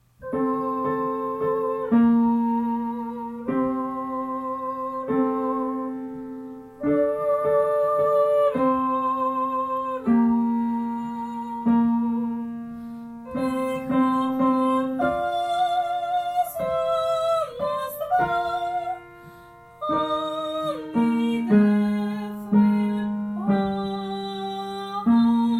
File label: tenor; west side story